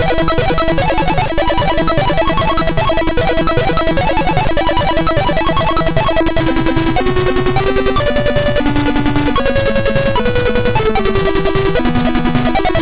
Intro, sid MOS6581R2

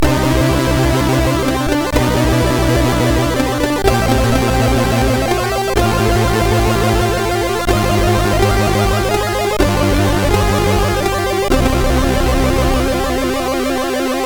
Jingle Game Over, sid MOS6581R4; hvsc

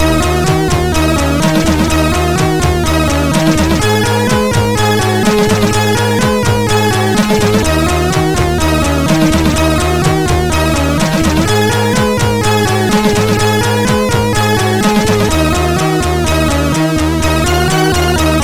Music MOS6581R4

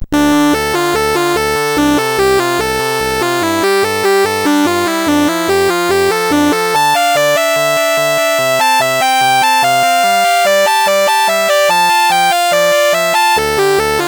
64 BASIC sid CSG8580R5; Muso

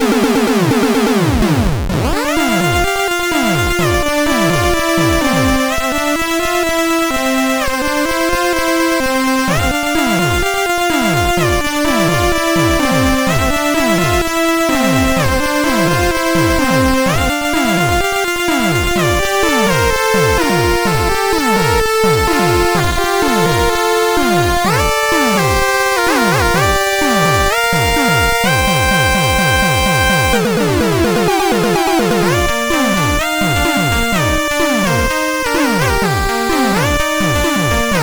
Stereo Beat, sid CSG8580R5

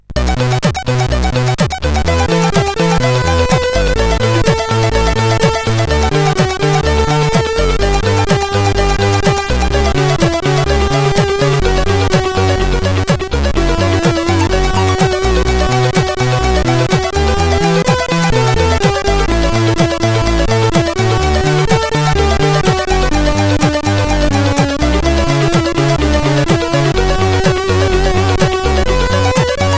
Intro, CSG8580R5

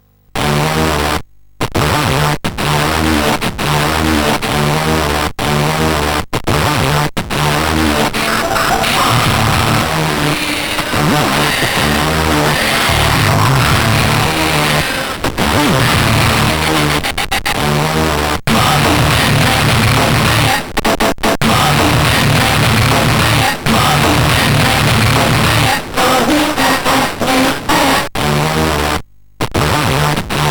intro, CSG8580R5; Emphatic 4

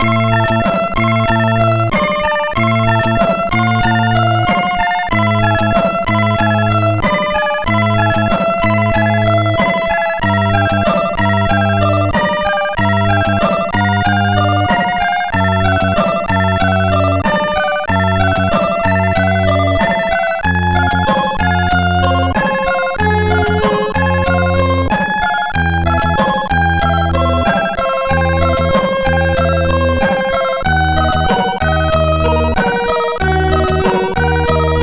Game Over, MOS6581R4; Sniff Sniff; Wijnhoven Joachim

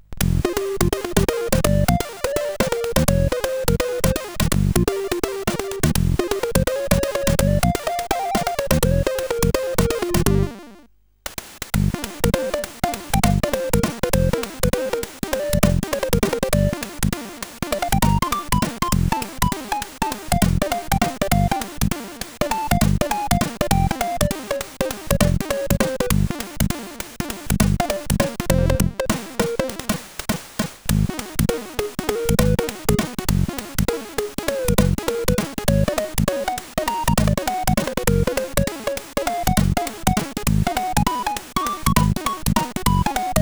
Jazz, sid CSG8580R5

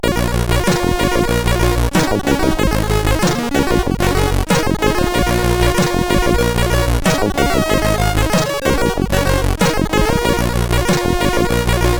Game Muzak, sid CSG8580R5; Moon

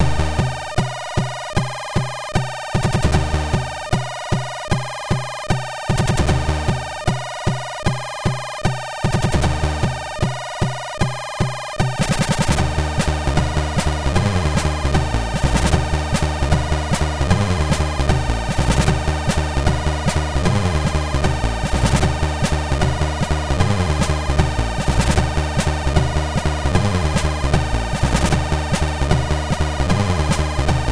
MOS6581R3; sid

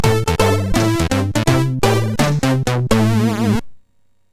Jingles, MOS6581R4 sid

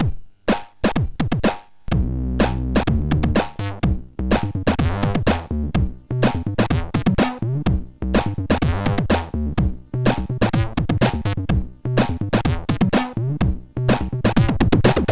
Dynamic Rythms, sid MOS6581R4

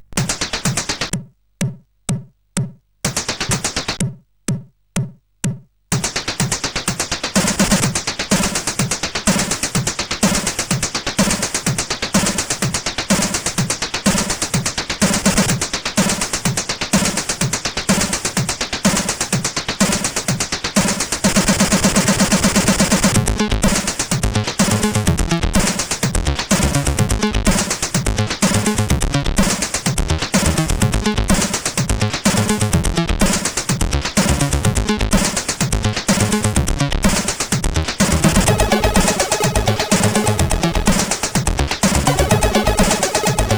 CSG8580

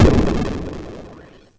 SFX, MOS6581; Narco Police

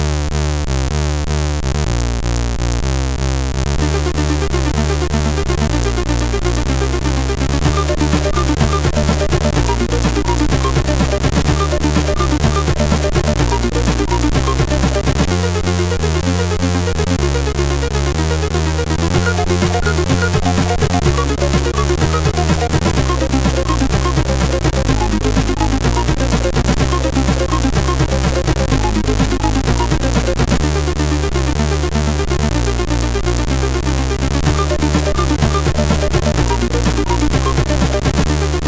Game music, sid CSG8580R5

